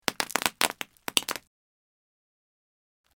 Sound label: Bubble Wrap Popping